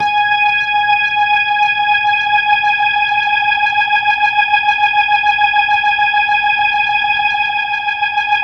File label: HAMMOND 4G# R; 3D Sound - 3D Keys VOL-1